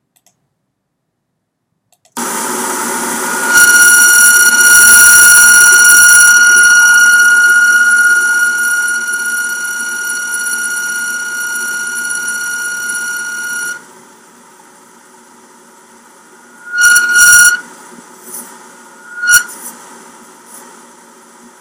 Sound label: Window AC Whistle Squeal; aircond